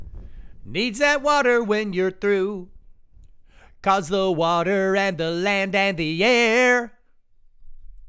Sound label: vocals